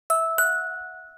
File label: 2 notes of musicbox; 113BPM; Pony Fan Music Remix Stems